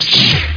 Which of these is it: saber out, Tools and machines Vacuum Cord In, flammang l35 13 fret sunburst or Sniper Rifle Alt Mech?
saber out